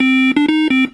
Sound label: beep